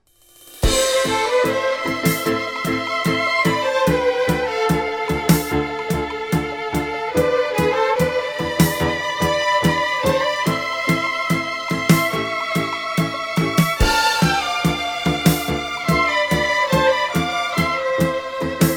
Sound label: Tango; muzyka k filmam; Zol Gol Na Plahe